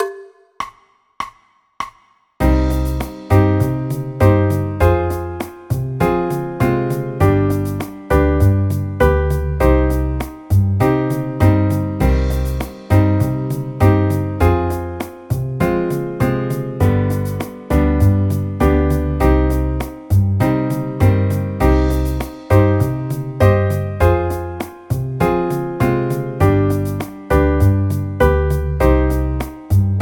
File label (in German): c moll akkordverbindungen